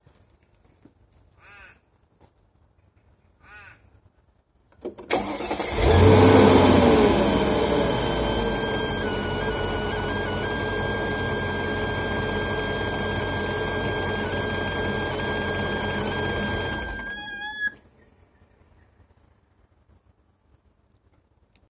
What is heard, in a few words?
m113 whining high pitch squeeling; mercedes; engine noise